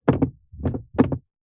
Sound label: vehicles car gear transmission stick manual movement into gear engine off; zapsplat